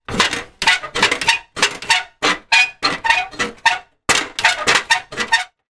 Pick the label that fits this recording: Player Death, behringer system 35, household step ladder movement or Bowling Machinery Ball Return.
household step ladder movement